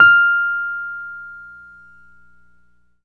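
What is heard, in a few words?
E PNO F5 MF; Synclavier Sampler Library; KEYS AND GUITARS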